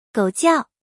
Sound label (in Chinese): 狗叫